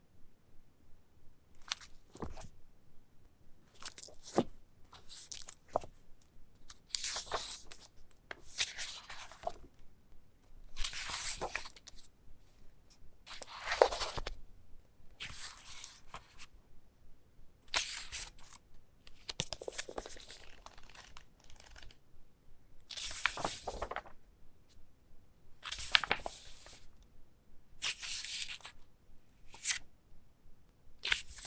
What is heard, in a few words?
books and paper